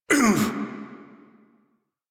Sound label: Pain Male; en; Warframe; Railjack Crew; Arbiters Hexis